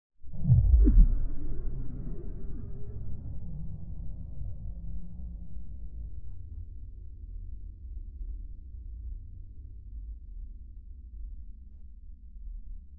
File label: crunch woomph